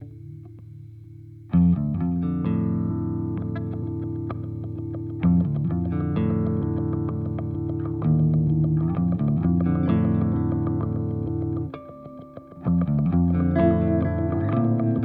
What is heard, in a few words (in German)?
Lethargy Gitarre; Dreadbox